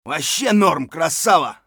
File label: scav cheer; eft